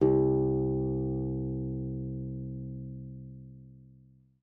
C2, guitar; Xsample VOL-2

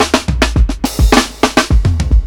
105 FILL, DRUMS LOOPS; BASEMENT DRUMS VOL 2

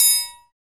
PRC TRIAN

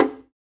PRC DUMBEK, AFRICAN PERC; Roland LA

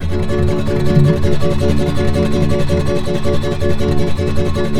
D# MJ, Guitars; Spectrasonics; Hans Zimmer Guitars VOL-2; FLAM TREM CH

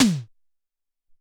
Snap Hex, Alesis SR-18

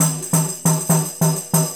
Tambourine

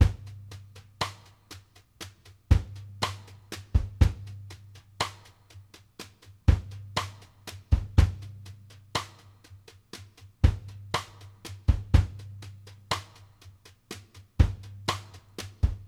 STRGHT, BALLAD 60; Sampleheads; New York City Drumworks VOL-1; SP BALLAD 60

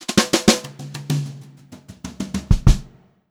FILL, Brushed Drum Loops

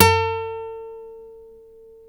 NYL NV B, nylon no vib guitars; Spectrasonics; Hans Zimmer Guitars VOL-1

